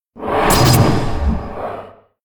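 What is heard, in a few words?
giant elk attack; monster; Senillinea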